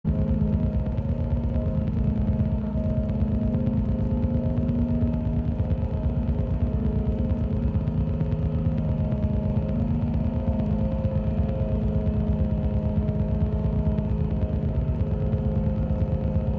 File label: Noise Electronic Music